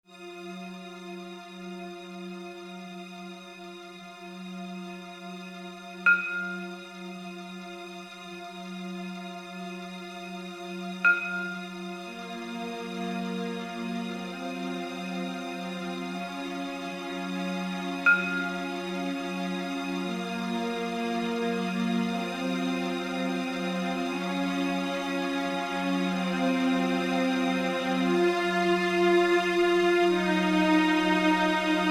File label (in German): Cello Konzert Satz